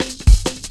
Rollin Shaker Cut, Drum Loops 166.5; Zero-G Jungle Warfare 1, 2 & 3

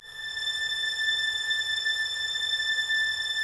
violins A 3, string ensembles; Miroslav Vitous

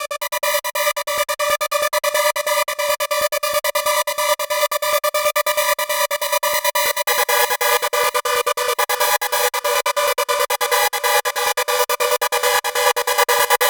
Progressive Synth, Trance

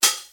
Open HH, DL-909

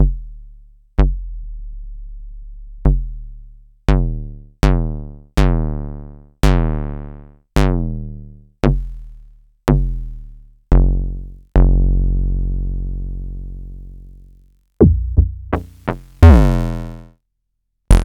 FutureRetro FR777

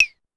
Claves Down, Pitch Env; Clavia DDrum-AT; Pitch Env Various

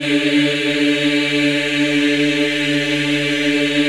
D3, Choir Acoustic & Synth; USB Soundscan vol.28; AKAI

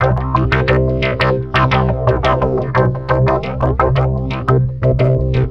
philter (sweep); Sonic Foundry (Sony Creative Software); Ugly Remnants VOL-2

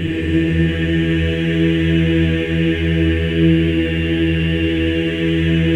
E3, Symphony of Voices men; MEN EES OCT

